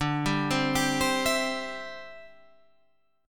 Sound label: C Major D